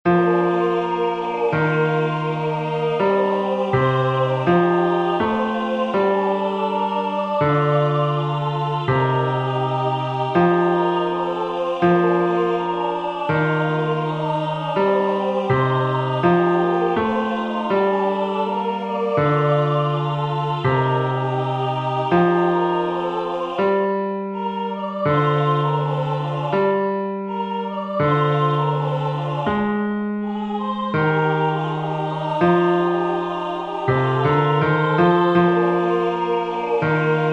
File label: BAS; Ave Maris Stella